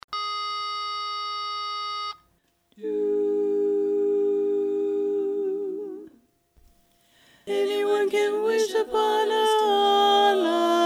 Tenor

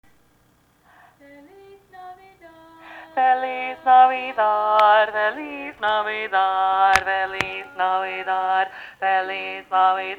Bass, chorus

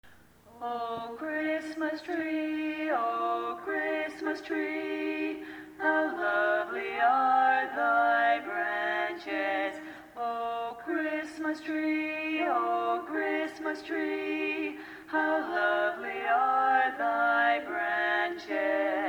Bass; O Christmas Tree

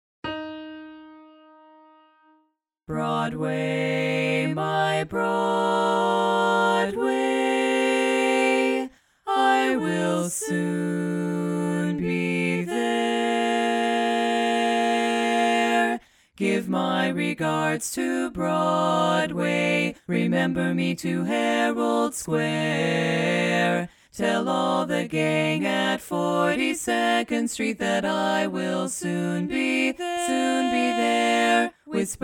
Tenor Missing

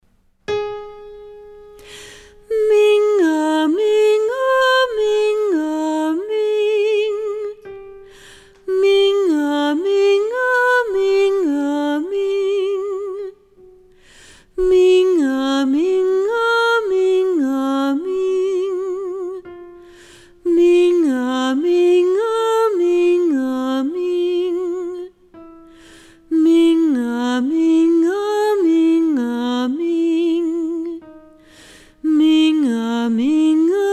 Mid Low Range Exercise; warm-ups

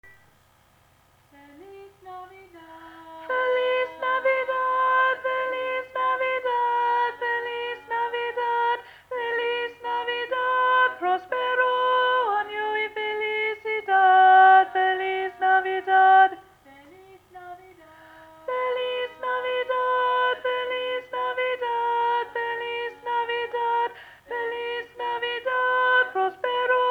Tenor, chorus

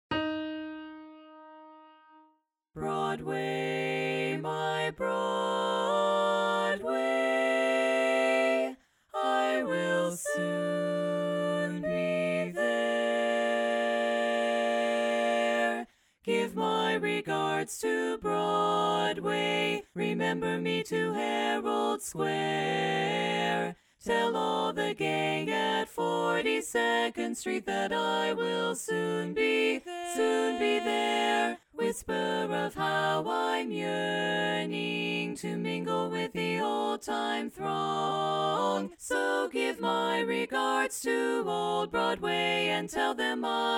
Tenor; Tenor Dominant

